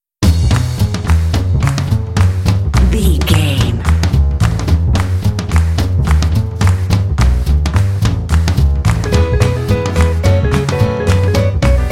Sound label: happy swingy happy swingy